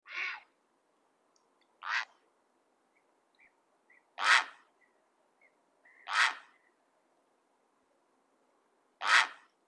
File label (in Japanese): aosagi; 漫湖水鳥・湿地センター; おうちミュージアム